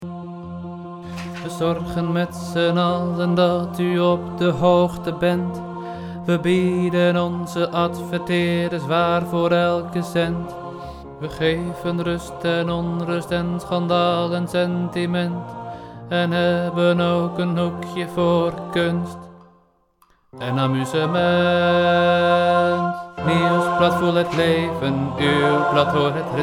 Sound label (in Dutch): bas